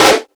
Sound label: snaredrums, techno; Techno ID